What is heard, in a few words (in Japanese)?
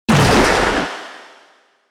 syoutotu